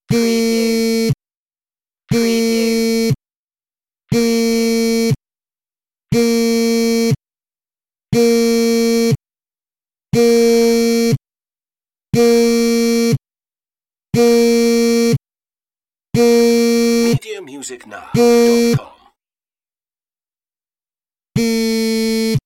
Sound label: phone vibration on table